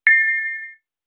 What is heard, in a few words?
arrived, message tones